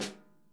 tap, Vintage Timetraveler kits; Sonic Reality - Vintage Timetraveler

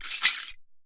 MARAKA SHAKE; Best Service; World Colours

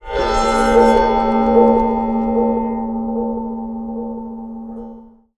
Moog LPF & Delay, contact mic, Wire & Tin; musicradar; contact mic madness

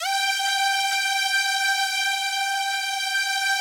space strings G5; Yamaha VP-1; Music Store

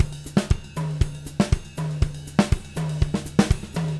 120, Living Drums, CARIBE; Sampleheads; Peter Erskine Living Drums

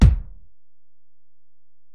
Ac BD, Alesis DM-PRO; 300 Drum Machines

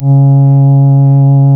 REED C#; Big Fish Audio; Synclav FM

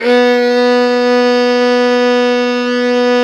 violin B1, Balkanish Traditions

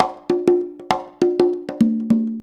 CONGA, Modern Percussion Loops

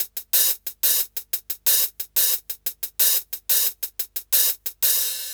Hi Hat Loop; Sonic Foundry (Sony Creative Software) - Fluid Dynamics Drum & Bass; Construction Kit

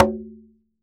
Drums Percussion Congas

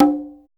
PWRCGA OPHI, congas; Drums In Your FACE!

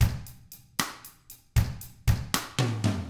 Drums, R N B 6-8; ILIO; Double Platinum Drums 1

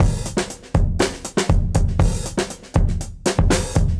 Beat 120, dusty funk; musicradar; Beats; 120bpm

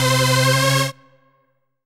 RAVE, RAVESYN

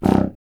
C. Bassoon, solo instruments

